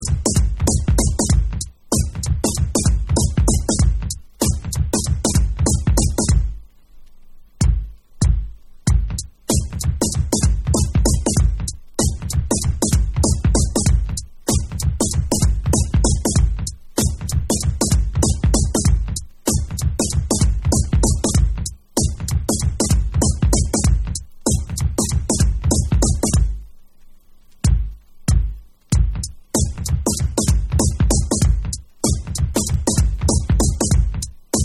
Instrumental

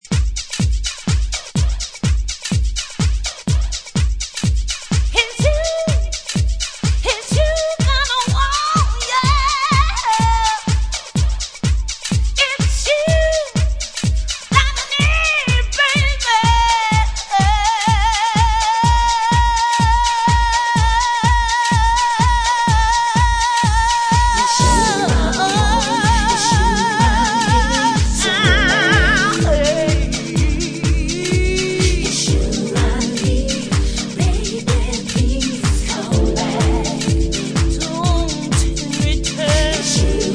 Deep Jazzy Vocal Mix

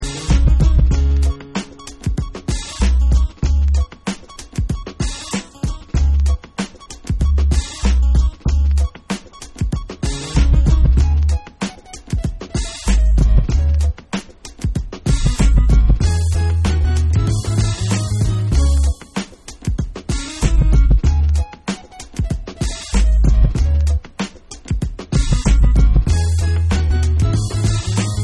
Instrumental

